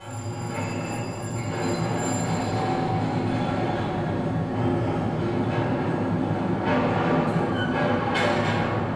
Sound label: mining; GrandFantasia